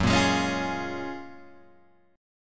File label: F sharp Major 9th F sharp, Guitar Open G